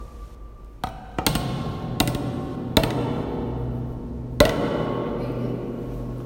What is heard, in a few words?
Bottle